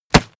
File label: punch mid tight